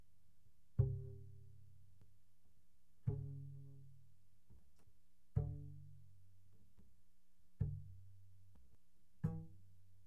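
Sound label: Bass pizzicato pianissimo sul E C3 E3, doublebass; Strings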